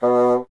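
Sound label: wind bassoon B; Miroslav Vitous - Solo Instruments VOL-1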